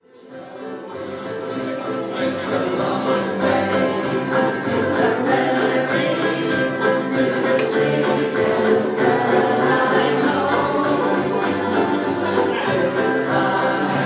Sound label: ukes